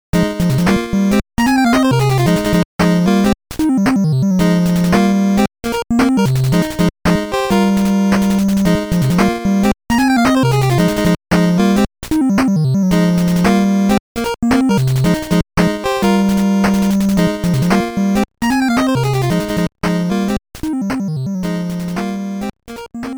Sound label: Stage Clear Bonus Scene; Armadillo; NES